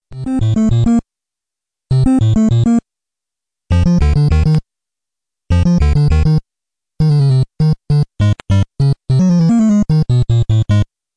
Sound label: Famous TV Ads Ringtones; mario